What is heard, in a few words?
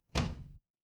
door close